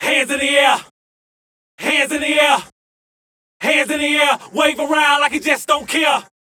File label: VOX